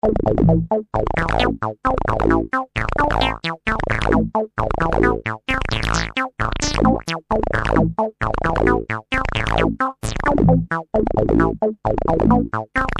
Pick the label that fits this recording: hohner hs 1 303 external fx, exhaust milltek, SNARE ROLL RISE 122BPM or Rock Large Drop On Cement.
hohner hs 1 303 external fx